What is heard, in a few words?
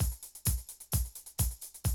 130, Classic DrumLoops